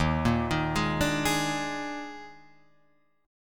D sharp Major 7th Suspended 4th, D sharp, down, Guitar, Open F; arpeggiated; D#M7sus4; JGuitar